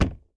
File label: bucket put